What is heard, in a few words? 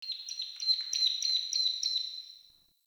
Wetland Forest Day Bird Distant; Ambience